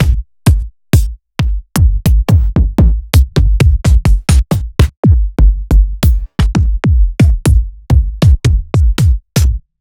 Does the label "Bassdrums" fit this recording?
Yes